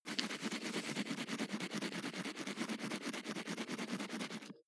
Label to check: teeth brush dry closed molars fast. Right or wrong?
Right